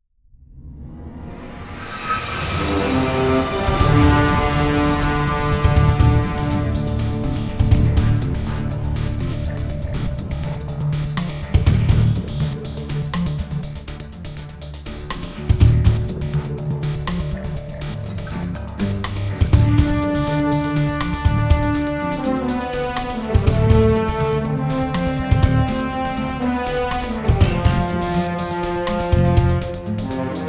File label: Music Low Tension Inspriational No Guitars; starcraft 2 wings of liberty; MissionMusic